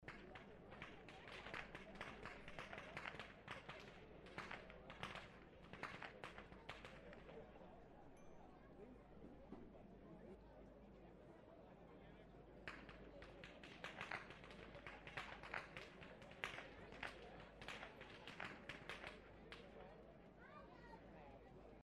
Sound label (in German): schnalzen, jugend